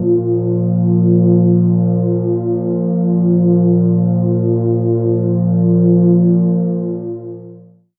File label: pad f3